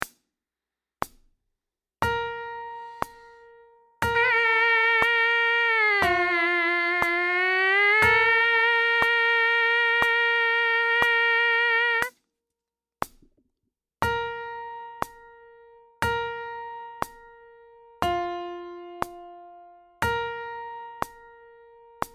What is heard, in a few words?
Mpc buzz; trumpet; Beginning Trumpet